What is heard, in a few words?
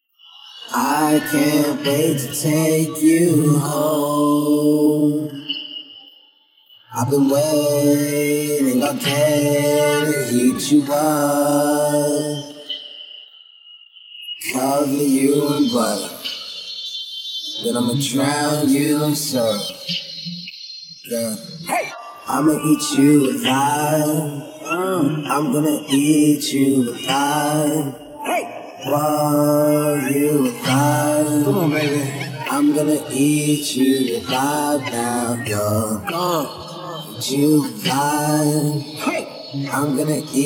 Acapella Theme Song; Aunt Wang Syrup